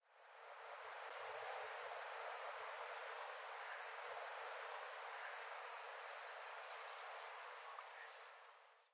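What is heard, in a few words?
Little Bunting, nagasaki; koe; kohoaka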